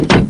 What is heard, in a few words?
door close; aim